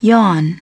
yawn

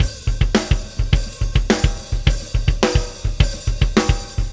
Rock Drums; Loops; ACID MUSIC

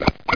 snap; monkeyj

